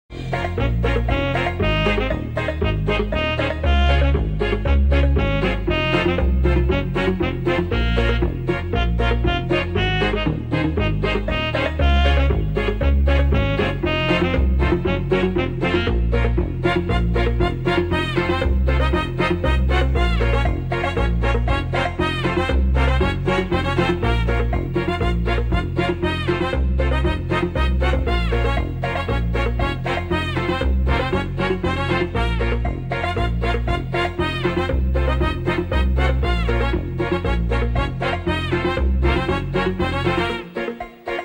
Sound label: Instrumental